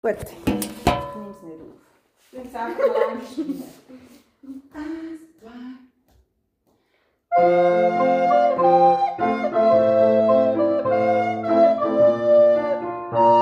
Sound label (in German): Blockfloete; Schulhaus Bollwies